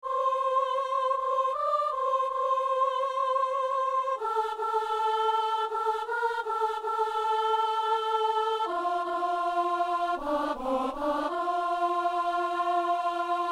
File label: soprano; Messe du 17 janvier à Saint-Denis